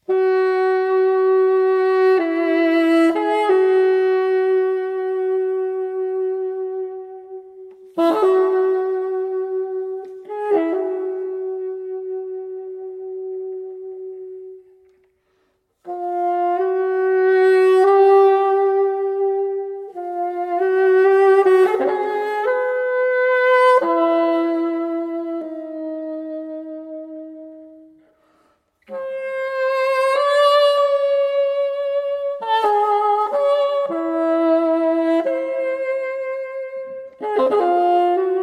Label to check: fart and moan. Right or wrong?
Wrong